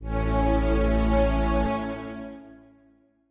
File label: Strings